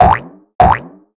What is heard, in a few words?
King Slime Walk